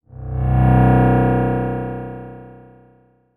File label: Transition Delay Medium